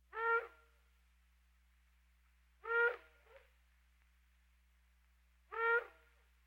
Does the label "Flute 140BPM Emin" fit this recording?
No